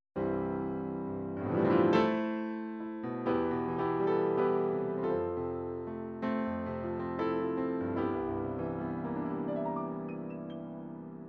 piano

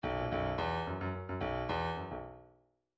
bdf midi file grooves funk r funk r

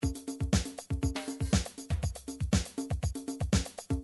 drums, midi drums

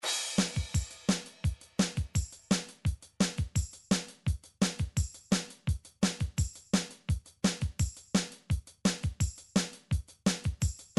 midi drum patterns drums country

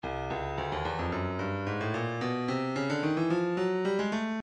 breakbeat 110 bpm; booganu e; Stylus Breakbeat Midi Files

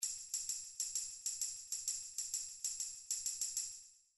individual drums tambourine, midi

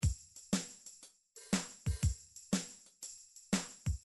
midi drums bouncy

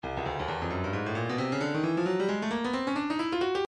stylus breakbeat midi files 133 bpm; Stylus Breakbeat Midi Files 130 139 Bpm

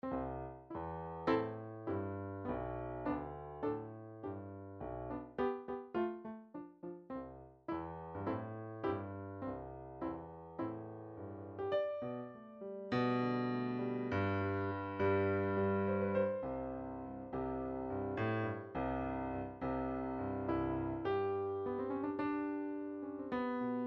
tango, midi

